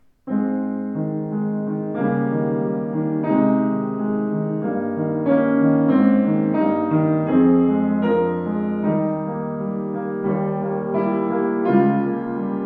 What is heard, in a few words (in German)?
Bechstein, klavier; 124 bechstein elegance silent